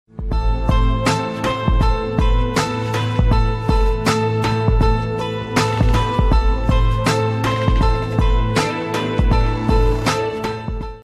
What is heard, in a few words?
guitar tune ringtone